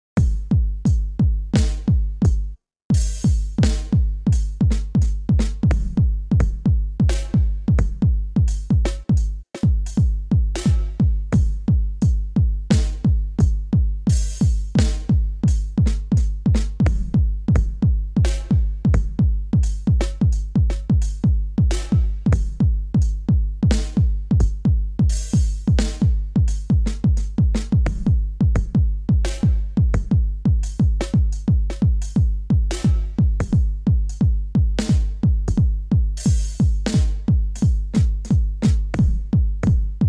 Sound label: Weird Drum